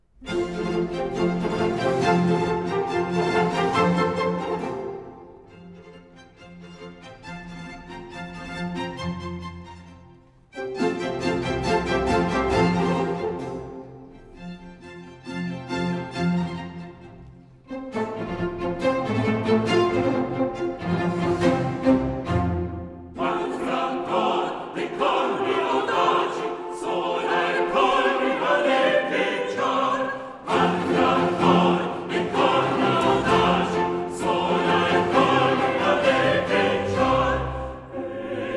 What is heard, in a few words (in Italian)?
Tutti (Coro); Atto II; Al fragor de' corni audaci